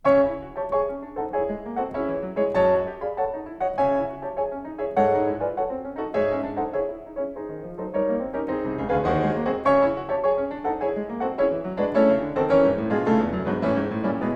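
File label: Symphonic Etude, piano; Shura Cherkassky; Schumann; Etude X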